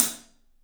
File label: ZIH13, 3D Drums, LITE ZILDJ H; 3D Sound